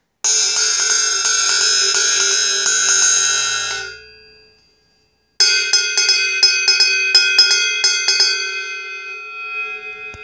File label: zp b top, drums